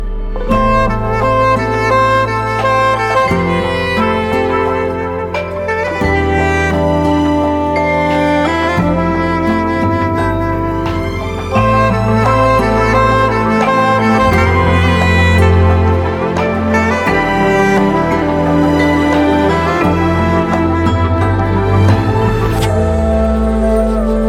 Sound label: Intrumental songs Ringtone